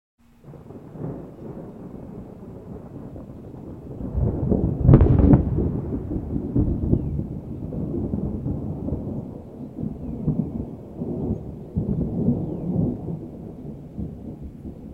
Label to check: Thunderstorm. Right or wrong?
Right